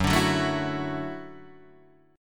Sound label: Gb Minor 7th Sharp 5th, Gbm7#5 chord; strummed; JGuitar